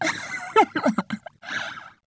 harpy idle; Seer; GamigoNA

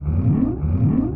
Hobby run

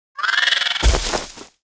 battle Beak hit; Seer; GamigoNA

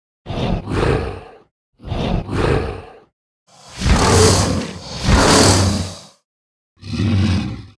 Shadow Dragon Idle

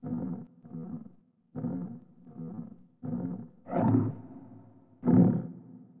Boar Idle

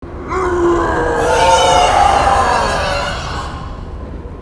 Ghost scream

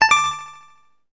Dice Coin Click; Seer; GamigoNA

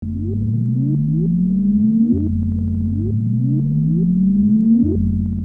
closed space; Seer; GamigoNA

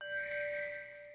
SFX Soul Stone; Seer; GamigoNA

